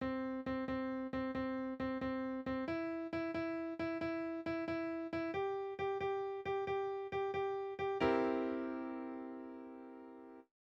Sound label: With a little swing